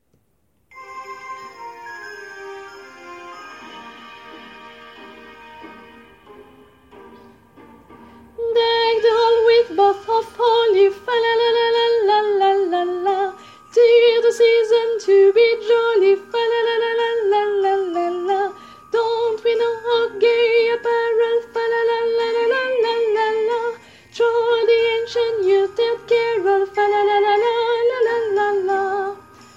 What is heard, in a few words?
Deck the Hall voix